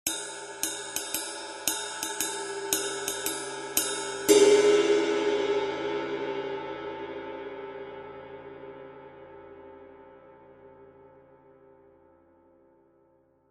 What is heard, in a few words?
20in Custom Ride, cymbal